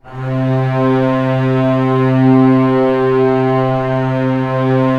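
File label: strings C2 FF L; Sound On Sound - Peter Siedleczek's Orchestra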